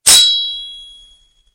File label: katana sound; gmod source